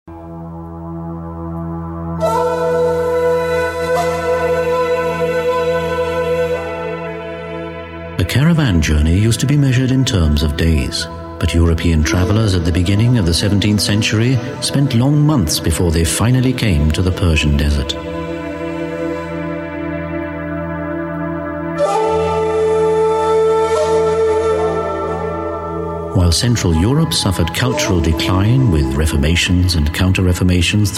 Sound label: persian desert